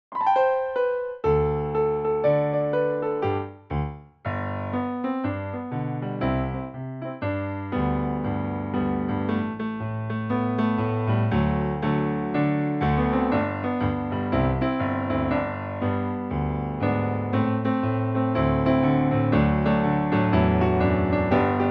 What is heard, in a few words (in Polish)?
Hymn apelowa wersja instrumentalna